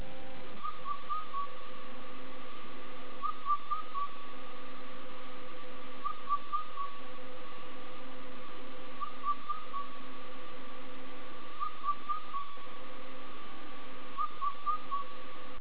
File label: Indian Cuckoo